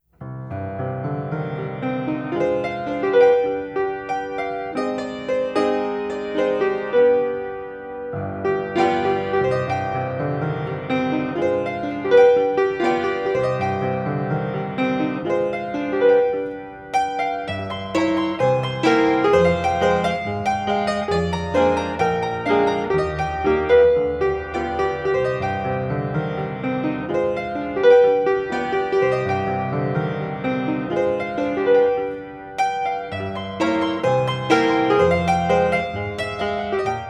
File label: Ragtime Nightingale